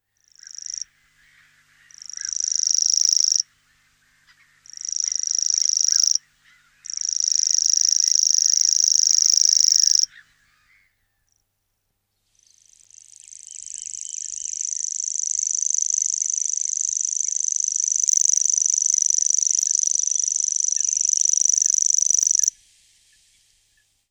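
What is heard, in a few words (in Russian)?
obyknovennyy sverchok golos, grasshopper warbler voice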